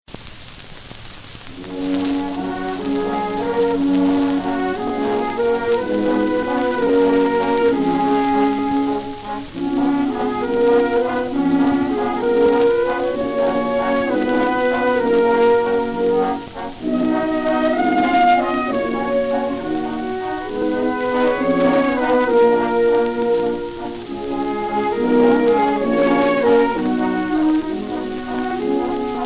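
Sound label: Orchestra Gramophone; Balinue sirenu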